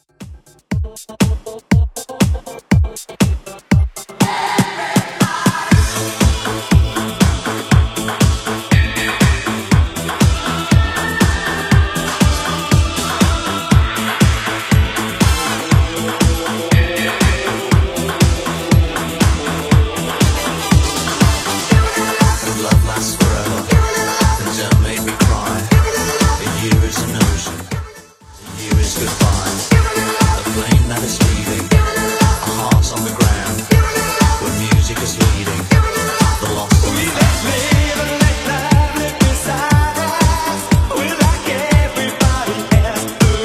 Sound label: 80s Redrum 120bpm